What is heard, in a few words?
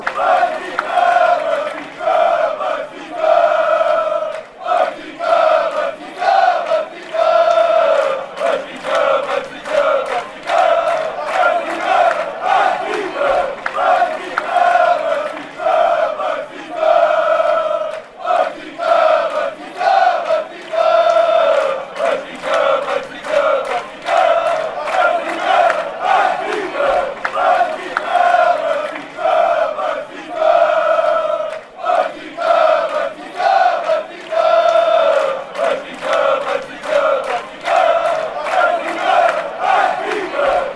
ben fi ca, fan chants